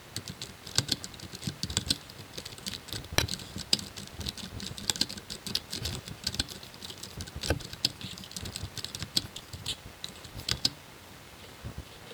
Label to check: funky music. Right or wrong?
Wrong